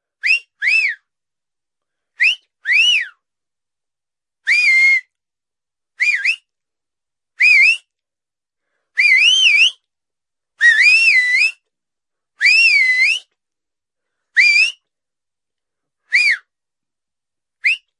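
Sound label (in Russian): свист звук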